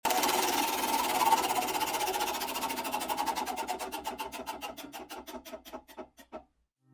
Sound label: Roulette Wheel